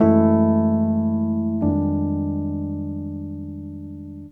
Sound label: CHORD, 150 BPM; Ueberschall; Drum & Bass Flash Trackz